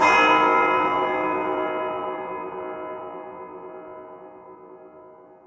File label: bell; edgar